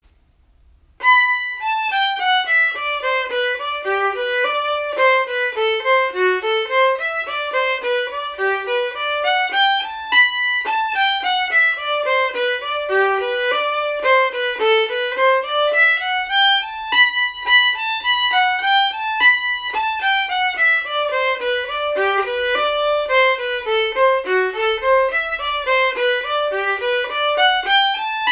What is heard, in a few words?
reel montreal harmony